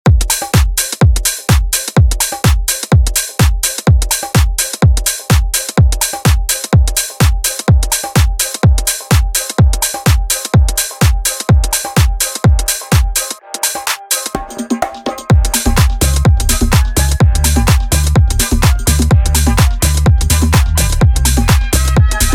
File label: Tech House Edit; Deejay Hit